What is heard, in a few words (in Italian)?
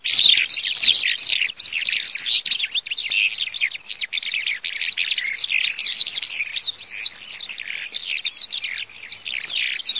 ALLODOLA